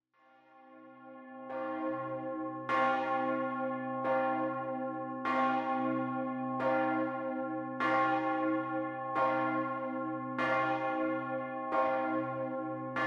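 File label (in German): schifffahrtsglocke, geläut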